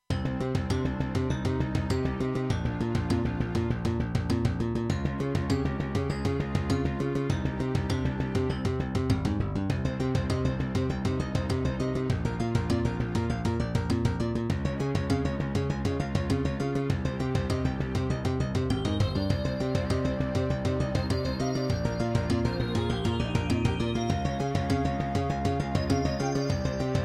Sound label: Serdaco X3MB Buran; Introduction; Hocus Pocus